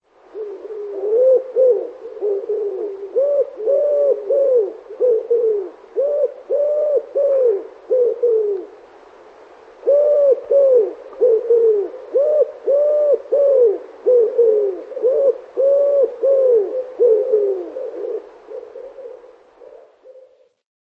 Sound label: Nature Wood Pigeon